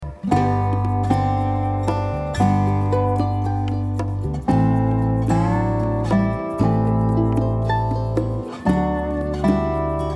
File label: Madison Shuffle Dobro Steels The Blues